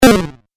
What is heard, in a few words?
8 Bit Style Drop; video game